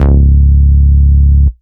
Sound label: Vengeance Ultimate Bass C#, Root C#